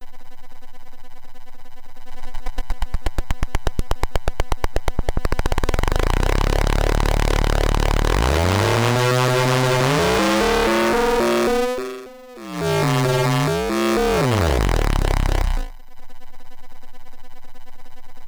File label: test saw filter